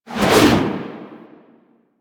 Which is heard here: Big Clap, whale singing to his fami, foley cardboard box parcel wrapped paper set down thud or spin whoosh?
spin whoosh